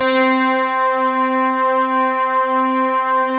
DIGI SYNTH; AKAI S-Series CD-ROM Sound Library VOL-2

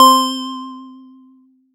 LED C4, Yamaha TX7 synth keys

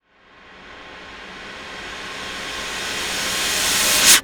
Waterphone Rush; Sonic Foundry (Sony Creative Software); The Electronic Music Manuscript Collection

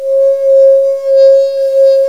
WINE GOBLET; Rubber Chicken Software Sample Bank

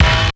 RY HOUS GIT, RY-30 HOUSE; Hollywood Edge; The Big Bad Beatmachine

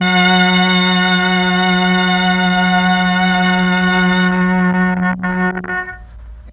MEL STR, Legendary M400

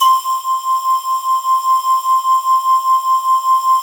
C5, ANALOG SEQ; Roland JV 2080

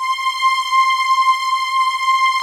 PAD STRINGS; Pro-Rec - Synthsonic

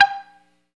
GTR X MUTE, Chunk Mute, Funk & Mute; 90's Sample CDs; Club-50 - Foundations Roland

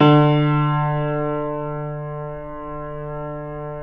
keyboards acoustic D2; USB Soundscan vol.17 - Keyboards Acoustic; AKAI

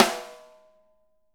6X12 ALUMNUM, Living Drums

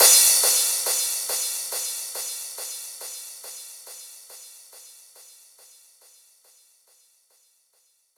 Crash, Cymbals

